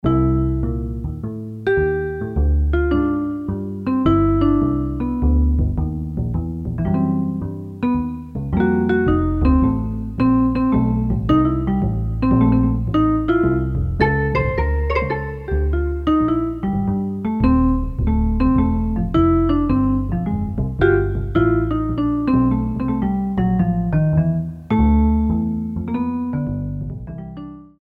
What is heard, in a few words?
BS & VIBES, Korg DSS-1